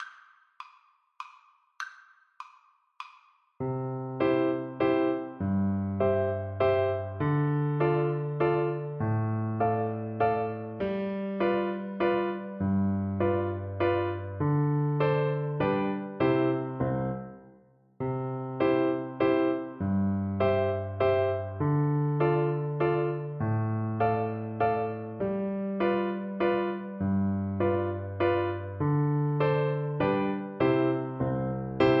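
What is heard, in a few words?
Waltz in A VLC karaoke, Cello; Schubert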